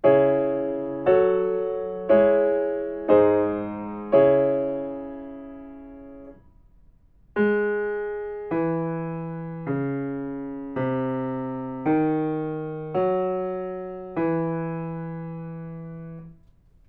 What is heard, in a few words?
melodic fragments